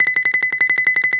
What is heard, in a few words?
Balalaika, Prima Balalaika, Plucked Strings